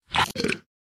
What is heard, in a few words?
bite extended; perdachcraft; minecraft